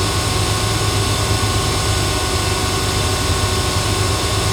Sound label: room amb compressor; Ambient; SpaceshipDemo